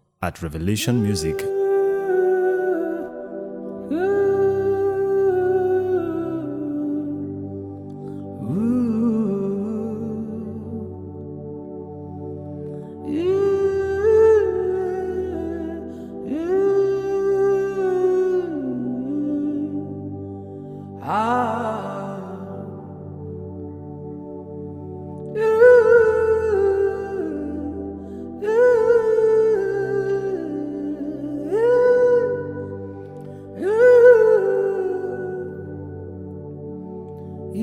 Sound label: Holy Ghost Humming